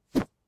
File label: Melee Attack